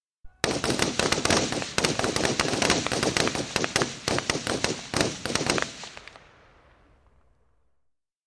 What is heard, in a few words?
5.6mm gas operated rifle, a section firing single shots (Close perspective); Guns Gunfire; BBC